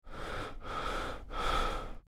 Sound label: Man breathing heavil